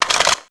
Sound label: Pickup Rifle; level items; Max Payne